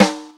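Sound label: RIM snare drum; Best Service Hyper Dance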